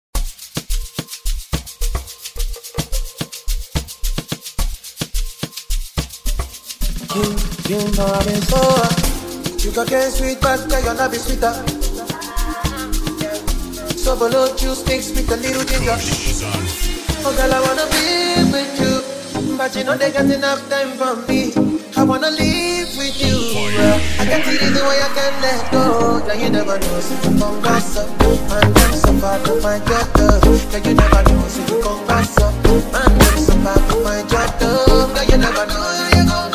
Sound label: Amapiano